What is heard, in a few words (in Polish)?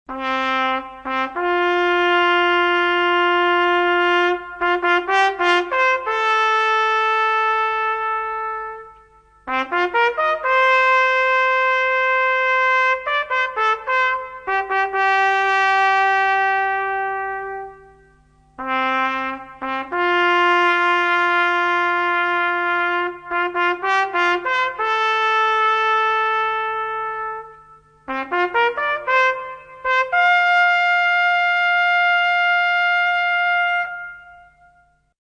hejnal